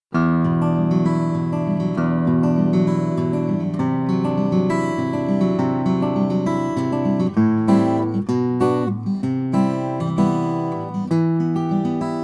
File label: threet, steel string guitars flattop